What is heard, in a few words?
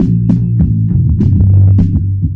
bass, Phonky; loops